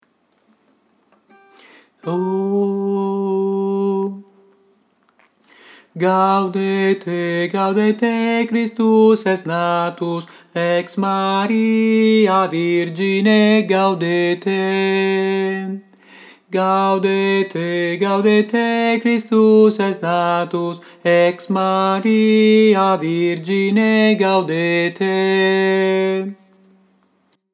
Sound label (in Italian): soprani